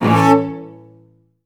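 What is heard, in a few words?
cellos A2; Best Service; Peter Siedlaczek's Advanced Orchestra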